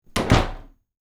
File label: door close